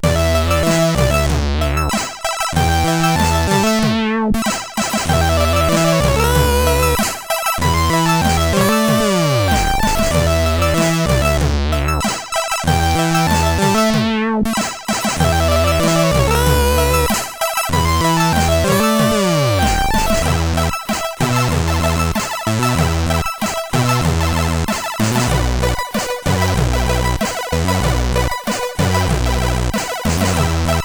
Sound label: Bassen, CSG8580R5